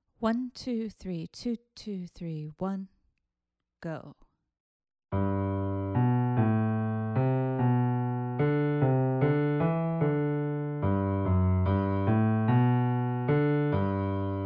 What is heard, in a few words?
melodic dictation